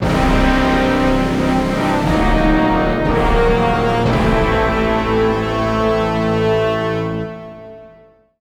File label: Music Hulk lose; The Incredible Hulk; PlayStation 2